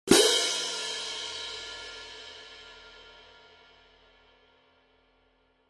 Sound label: K Con Med Heavy, cymbal